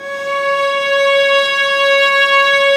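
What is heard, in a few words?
violas, ambience, piano; String Master Series; Vlas Sect3-4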